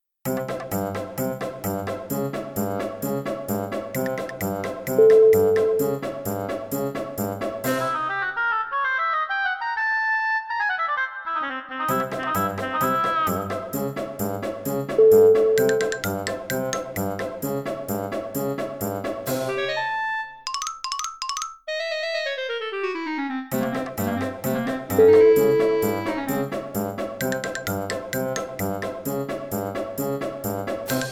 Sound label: cartoon game over